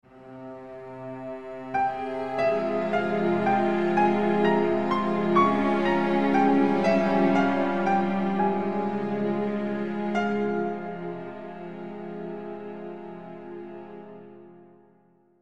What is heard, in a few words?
Sad End